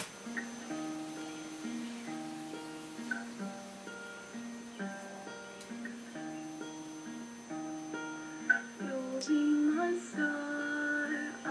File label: singing